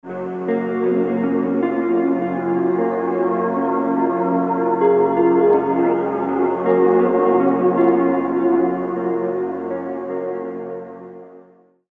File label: Pipe Choir